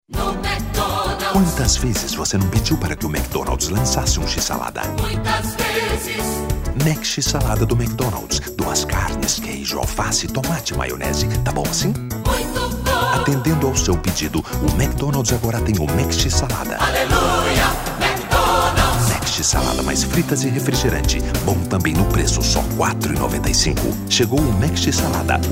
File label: jingle; MAC DONALDS